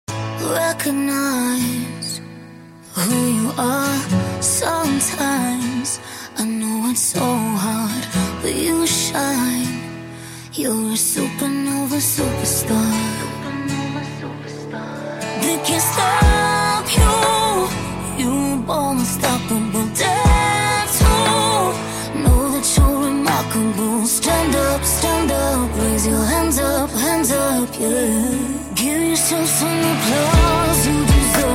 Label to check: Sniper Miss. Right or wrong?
Wrong